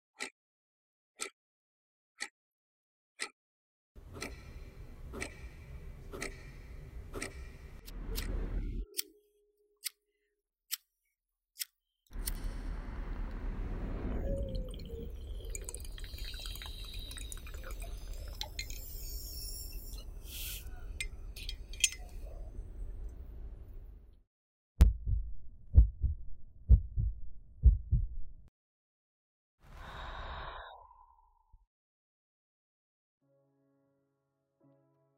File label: orchestra